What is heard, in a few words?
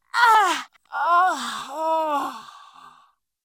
Voices female death